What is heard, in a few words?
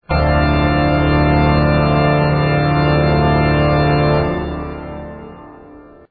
fugue d minor